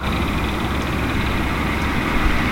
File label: Kingfisher Call; birds